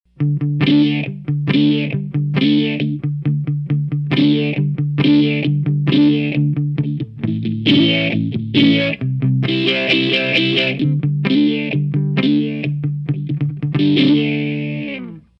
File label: Strum Trigger